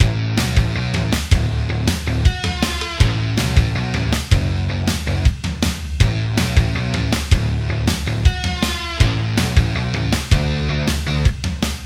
3d Music

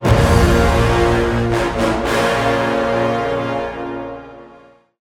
Enemy Spawn; StormKing

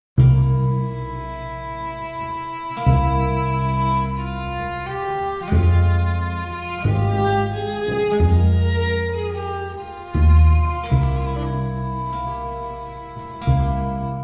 Melody; Nancy Drew: Secret of the Old Clock

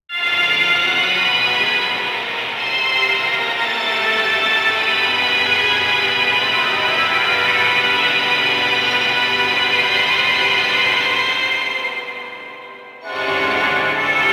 Horror Organ; The Darkness 2 gamerip 2012

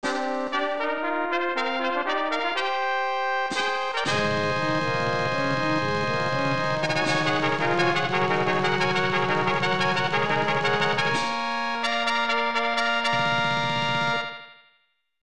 G1 Fanfare; Derby Stallion II; SNES

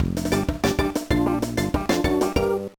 Jingle, gba; Backyard Baseball 2006

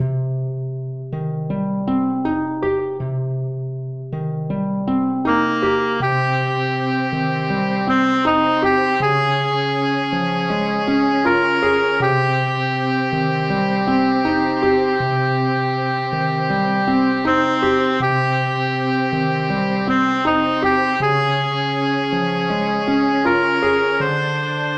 BGM Sad; Dark Gate RPG; android

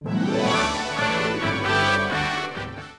Sq Jingle Round; New Chuugaku Eitango Target 1800 DS; NDS

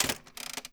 crank; Five Nights at Freddy's; FNAF 3

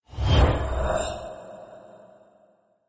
demon die sound; Arc of Templar

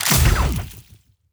Weapon Shoot

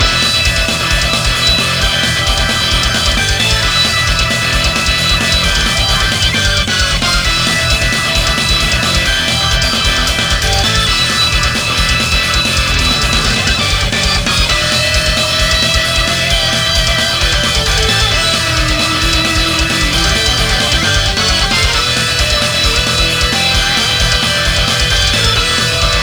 battle; Shin Megami Tensei Imagine gamerip

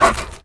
wolf noise

